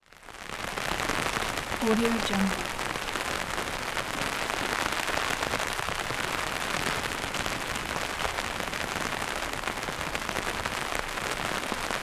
rain on umbrella

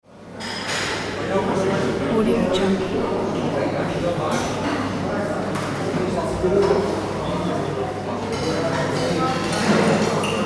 canteen ambience

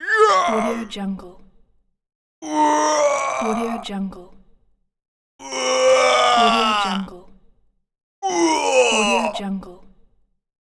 man hurt

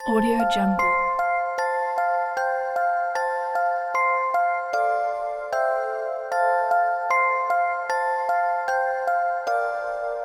tiny holiday kit

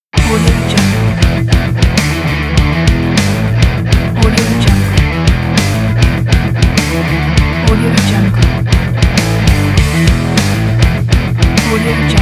energetic rock logo

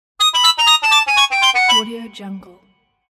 cartoon accent

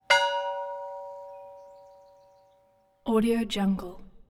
church bells; prosoundfx

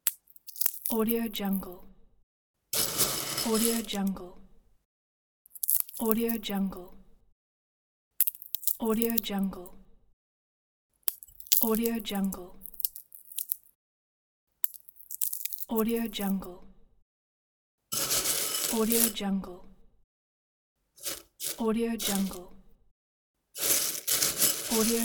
seeds

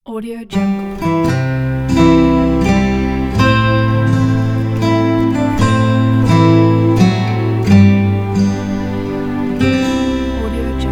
american logo

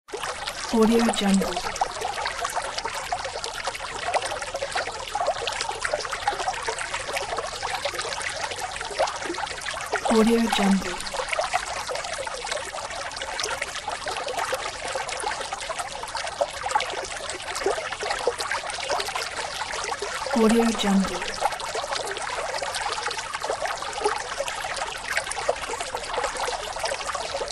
stream; archisound

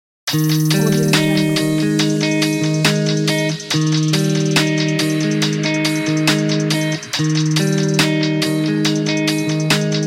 melodic pop dance

